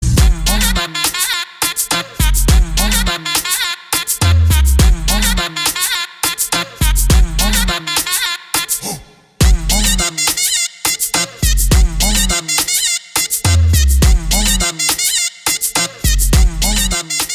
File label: INSTRUMENTAL